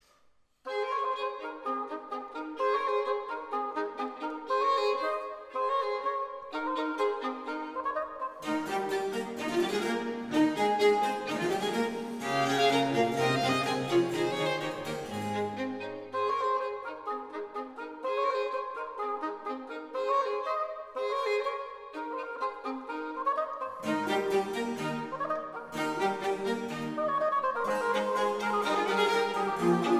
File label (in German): Concerto A Dur Vivace